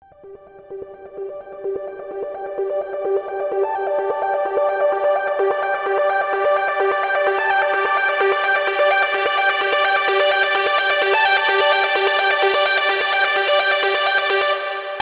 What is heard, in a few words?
128 G Buildups Arp, electro pop melodies; Pulse